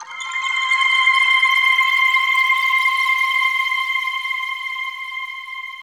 C, Drum & Bass Pads; Total Drum&Bass; 90 s Sample CDs

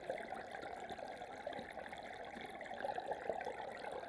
Waterway E Light

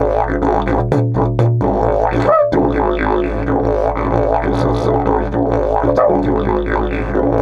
130, Didgeridoo

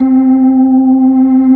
B3, Drawbars; E-MU Producer Series Vol. 2; More Studio Essentials

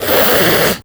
SERIOUS GROWL, Human; Distored Reality

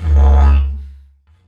Didgeridoo Kit Samples

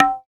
Tablas; Spectrasonics; Supreme Beats; World Dance

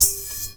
BRUSH BOWL, PERC METALIC; Rarefaction; Poke in The Ear With A Sharp Stick

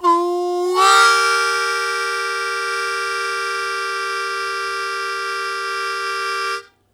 Blues Chord Attack Eb1, Harmonica; Zero G

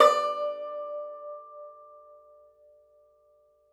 Tenor Banjo

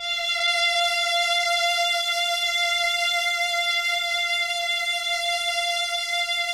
CLN STG F#4, CHAMB STR; Sonic Reality; Vintage Timetraveler

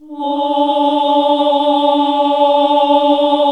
OHH D2, classic vox

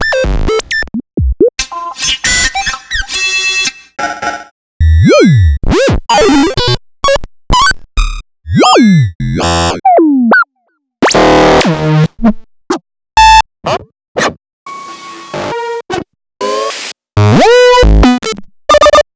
BLIPS & DISTORTION

